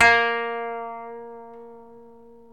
KOTO MILD

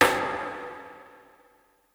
Rim; Rim Canyon; Lost Tapes From Mars; Clap & Rim

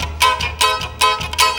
TANBURA; Best Service; World Colours

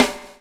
BUBBLE; Spectrasonics - Backbeat

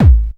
kick techno, drums; Techno Trance Essentials

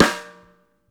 DEADER, BLAK TAMA; Sampleheads; New York City Drumworks VOL-1; SP BLAK TAMA

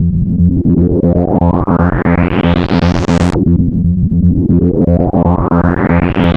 ARPS LOOP, MAGIC ARPS; Zero-G Ambient 1

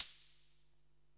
EH32 CYM, Electro-Harmonix DRM-32

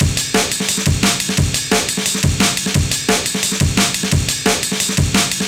Amen EQ 175, classic dnb breaks

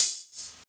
Open Hhat, Hihat; Equipped Music; Loungin House

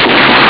explode; ST-XX Amiga Soundtracker Sample Packs

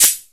hihat semi, Emu Sp12

